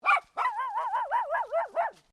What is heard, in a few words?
Animal Dog Poodle Miniature Bark; Various domestic dogs; Animals